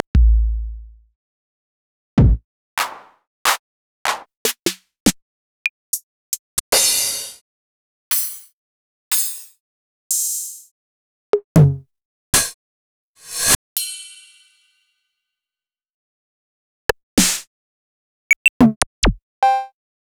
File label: 808 & 909 Kit; Roland Juno-D